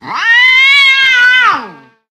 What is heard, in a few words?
cat, halloween; Source Engine; tf